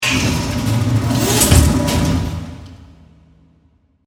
Machine Press, Machine, Press, Sequence, Single, Press; 5.1; BlastWave